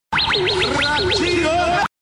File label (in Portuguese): vinheta; ratinho